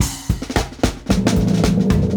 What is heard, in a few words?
Boss fill; when vikings attack